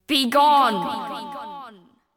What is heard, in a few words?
psychic scream